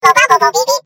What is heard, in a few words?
bb8 beep beep